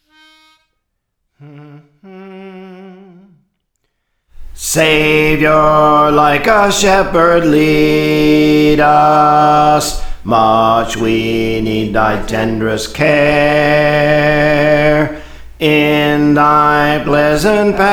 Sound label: Bass; Praise For The Lord Training